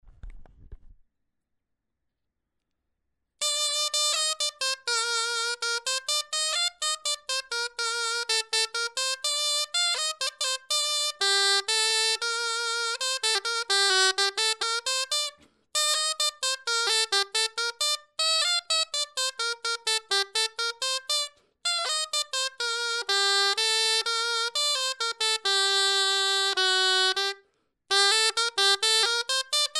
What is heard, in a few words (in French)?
clarin etude